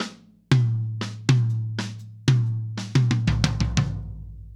Ballad Fill, Drum, 70's Ballad; Sonic Foundry (Sony Creative Software); Drum Tools; Laidback

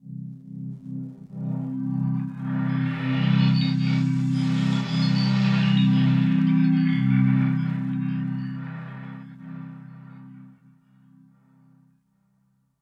Ambient Pad, Drum & Bass Melodic Synth

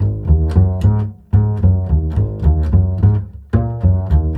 COOL G#, Bass; Spectrasonics; Bass Legends; MR. COOL

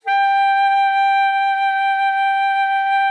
G5, ALTOSAX; Xsample VOL-2

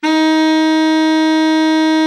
BARITON, BARITON SAX; Best Service; Brass Super Section

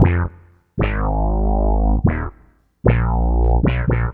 bass, Synth Bass Loops; Sonic Foundry (Sony Creative Software); Groove Elements

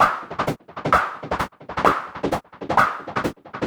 Modular 130BPM

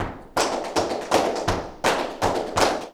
flamenco tapping, jaleo; Best Service ProSamples vol.46 - Flamenco

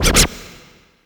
Scratch L, Yamaha PSR E305

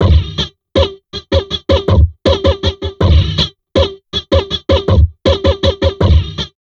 Drum Loops Crazy Processed

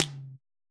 perc, Roland MC-909

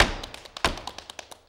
STEP + CLAPS, MSTEPS+CLAPS; Best Service; World Colours

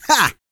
GRUNT, MALE HUMAN; ILIO; Vocal Planet

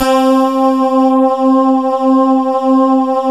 AIR VOICE, SWING SYNTHS; Zero-G - Funky Elements

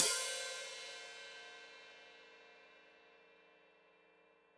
Hat Foot Open, Hi-Hat; Deeply Sampled Drums; Cymbals Stereo

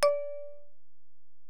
Bell, Alesis DM-PRO

